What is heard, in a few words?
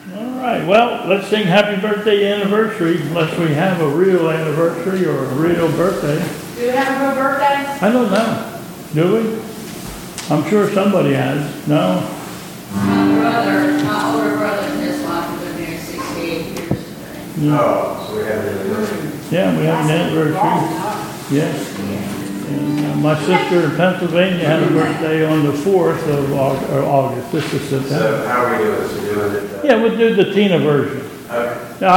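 Happy Birthday, church service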